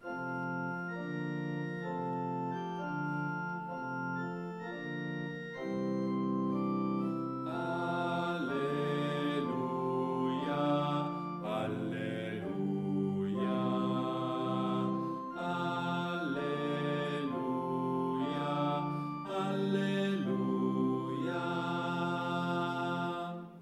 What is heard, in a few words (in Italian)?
Alleluia BASSI